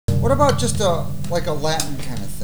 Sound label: LIKE A LATIN